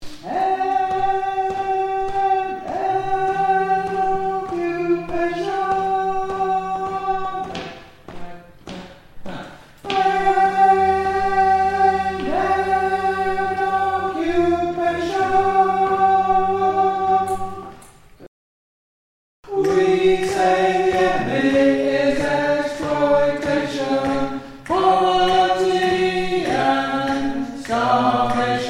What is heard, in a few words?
blood on your hands Sop with end